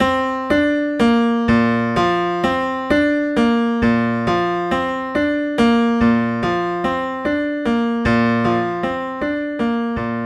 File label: shepard